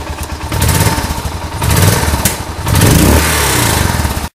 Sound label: suzuki f50 rev, motorcycles; mono mic